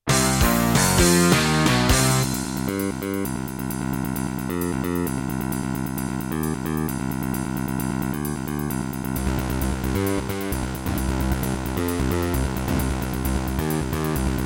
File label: Boss Dr. Synth DS-330